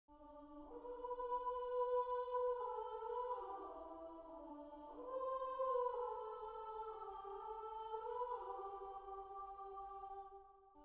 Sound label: Siyahamba Soprano